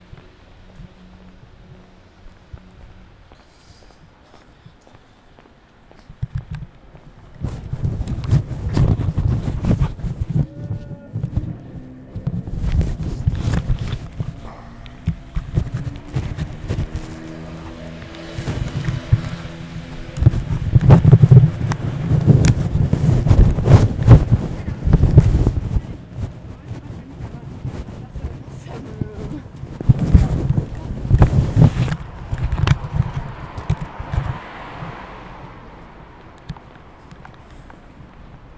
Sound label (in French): sortie travail - Amb ville Angers; ZoomH2N